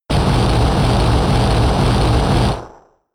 Magma Storm

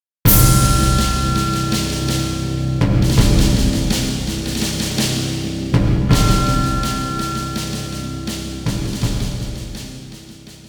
Short Jingle; Soulcalibur; Dreamcast

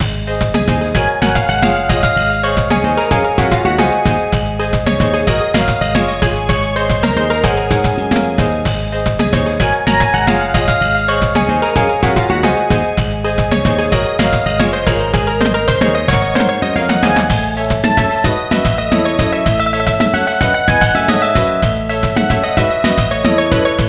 Loading Screen; fortress2 blue v.600

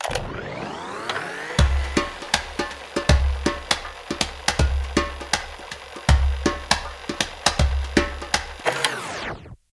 Busking Djembe; club penguin island; android

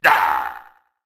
pain

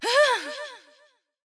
Death Vo; Mother Nature

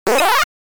Monster Squashed; Dig Dug; arcade

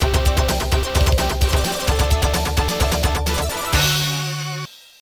Goal; Soccer Brawl; arcade

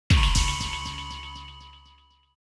Silver Credit Obtainment Jingle; Metroid Prime Remastered

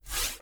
Skill2 Pull; Antihero

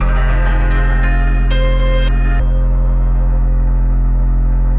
Retry Jingle; Dguy; Friday Night Funkin D-Sides 2.5 ost 2022 windows

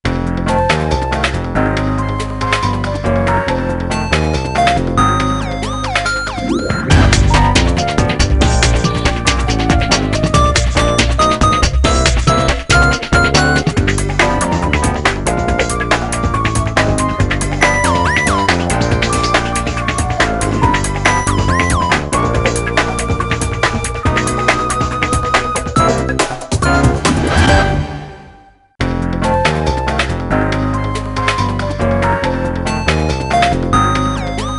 Goofy Music; Daring Game for Girls; NDS

